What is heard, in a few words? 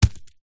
stone med drop on dirt; zapsplat; foley